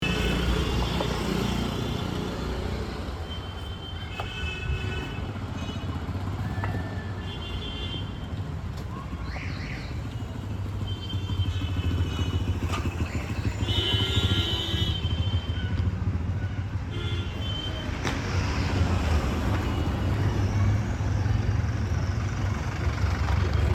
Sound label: city